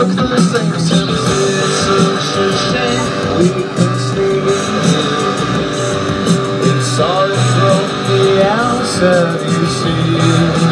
last soundcheck just before festival opens its doors; midi midis; godiva festival